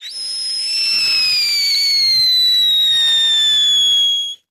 Fireworks Whistles

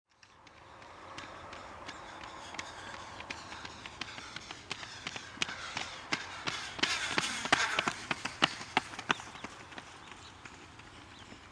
Jogging Two Runners R, footsteps, runners

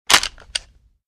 Door Lock Clicks Pla; latches & bolts

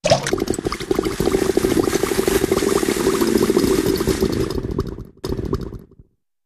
Fast Hot Water Boil

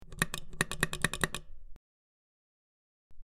Button Press In Out Sp, switch clicks

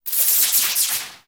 Lightning Crackle

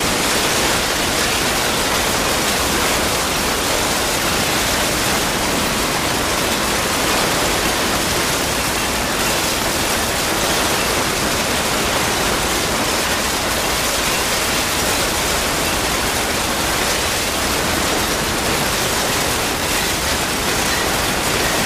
Mass Destruction, demolition

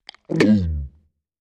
Gigantic Monster G

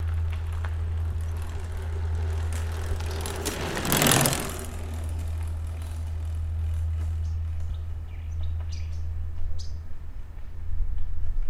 Mt Bike By 20 Mph, bicycles

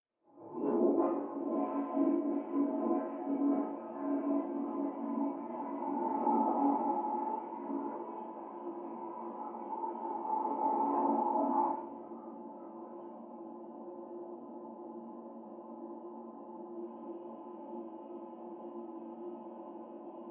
Toilet Flush Water, pipes

